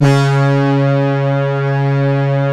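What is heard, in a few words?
synth, Prophet Five, Analog; E-MU Formula 4000 Series Vol. 3 – Analog Odyssey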